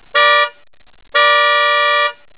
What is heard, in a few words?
air bullet